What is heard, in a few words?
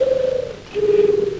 purr; startrek; Tribble